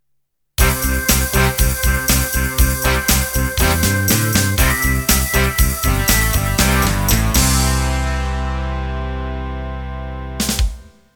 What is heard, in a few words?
Open Rock 8 End